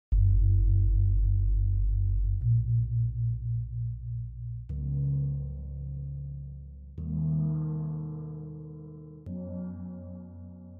gong kempul slendro

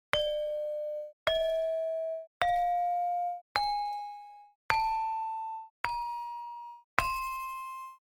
saron barung pelog

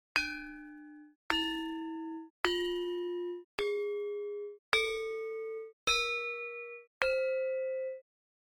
saron demung pelog

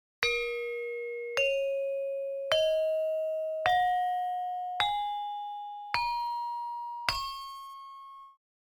saron barung slendro

